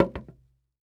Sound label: Foley Footstep Golf Cleats Single Step On Wood Board Walk; Foley Footsteps